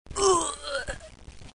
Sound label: SFX Human Death